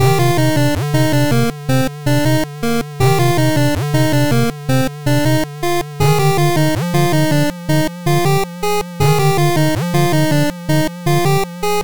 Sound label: underground bpm80, 8bit action stage select